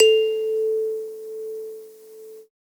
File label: Ikembe A2; Multisamples; Ableton Live 12 Lite; Core Library